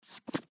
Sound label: card deal; new-years